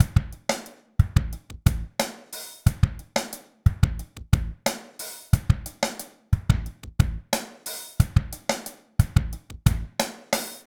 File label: 90 slow, loops, hyper dance; Best Service